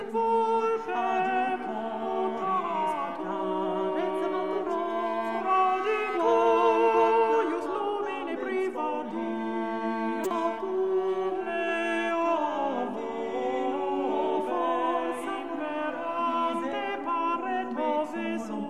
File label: Isorhythmic Motet; Philippe de Vitry; Garrit Gallus; In nova fert